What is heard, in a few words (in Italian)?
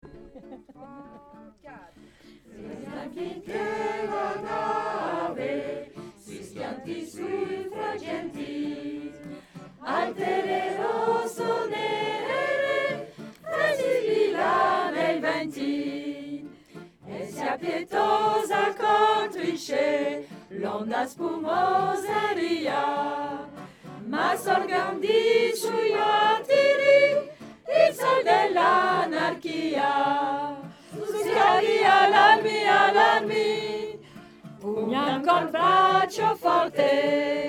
sopran